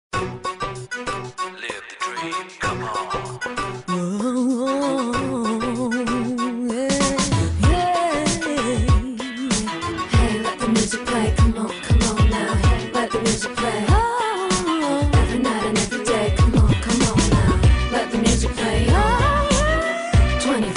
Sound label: hip hop theme